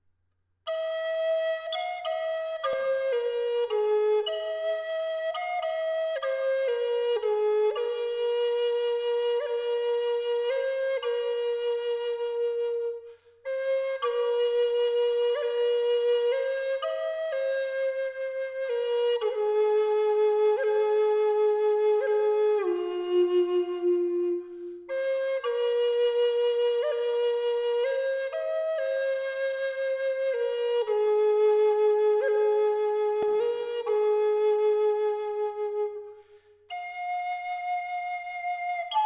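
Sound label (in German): Wacholder Fis 432 Hz; Bergkristall; Feder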